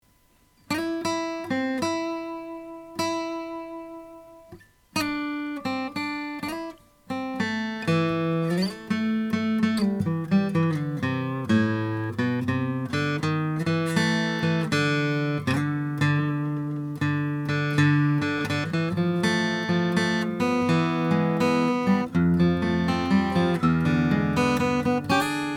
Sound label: om cutaway koa